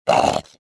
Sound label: pain